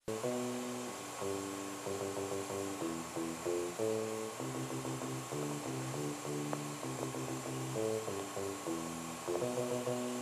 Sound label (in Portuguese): Falso baixo baixinho